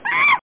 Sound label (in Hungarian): csimpánz